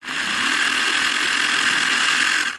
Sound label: science fiction robot move tracks roll floor medium